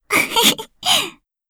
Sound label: comboburst